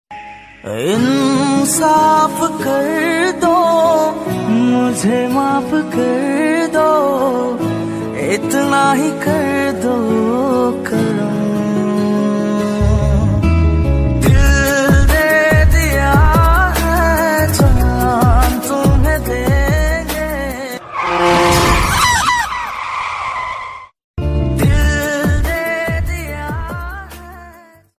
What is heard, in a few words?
bollywood sad ringtone